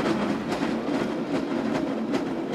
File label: Cart loop; adams venture origins